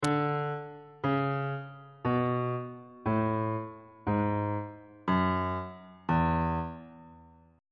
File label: f flat mixolydian mode descending on bass clef